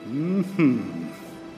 mmmhmmm

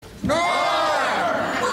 cheers normmmmmmmmmmmm

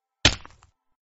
fall damage

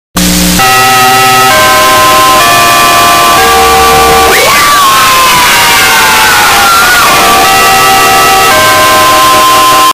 very loud; fnaf 2

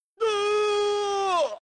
scream; zero nightmare

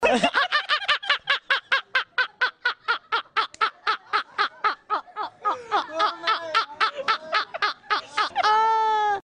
laugh

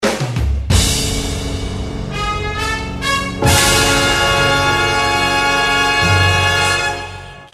dramatic end

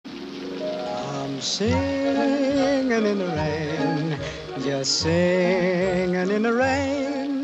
singing in the rain

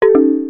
solidworks error badunk

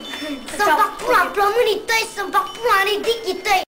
urban groove

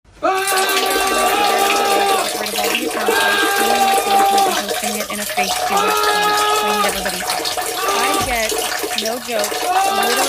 arabic man screaming pee

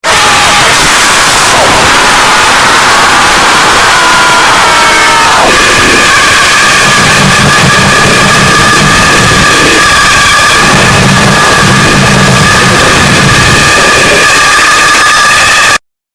jumpscare; zalgo spongebob

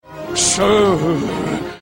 chuuuu

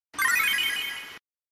sound effects save; final fantasy viii

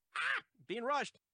aah being rushed

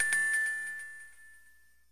nintendo ds birthday startup

